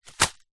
seeds packet black chia set down on wood counter

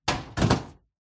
office metal cabinet door close

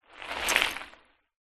transport bicycle pass fast gravel surface

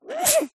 cartoon character cute high pitched sneeze

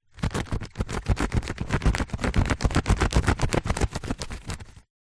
paper sheet cut with serrated knife; foley

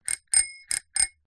transport bicycle bell ring

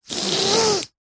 human sniff snot cold nasal close up short; zapsplat

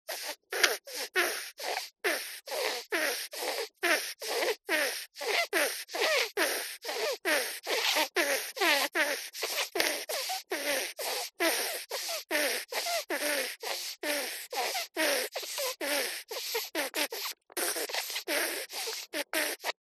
cartoon mouse snoring

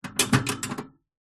animals mouse cage metal cage hatch open

